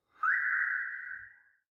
Car Alarm Disarm

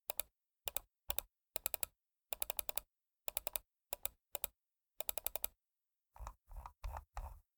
Mouse Click

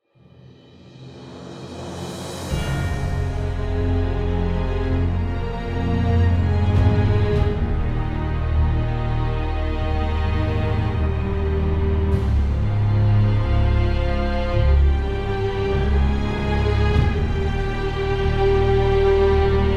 Epic Heroic Orchestral Dramatic

